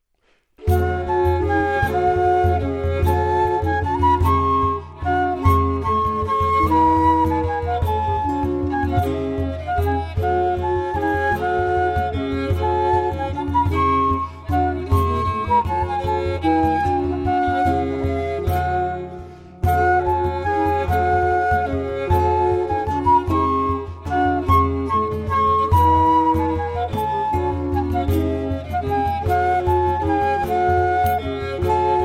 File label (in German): Basse Danse, Renaissance und Mittelalter Musik auf historischen Instrumenten